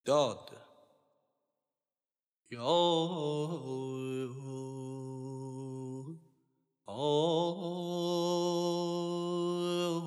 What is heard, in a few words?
Daad Mahur Radif; Dastgâh Mahur; Radif-e Karimi